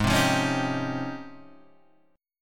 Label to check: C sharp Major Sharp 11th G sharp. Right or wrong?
Right